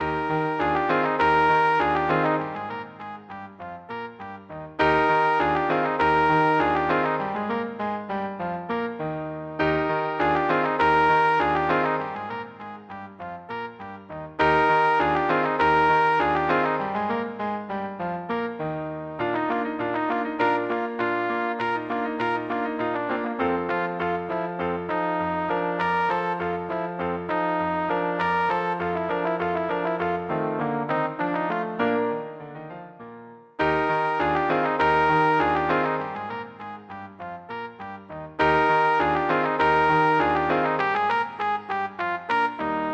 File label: musette, trumpet; bach